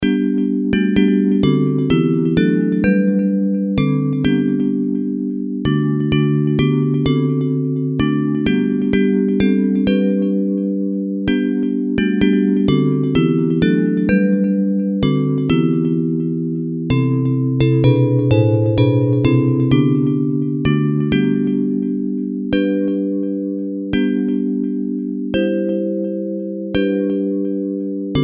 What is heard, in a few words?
Bells